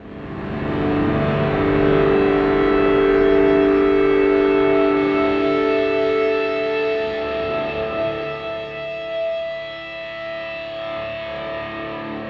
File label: Elektro Mass, Elektrik Drone, Sky Church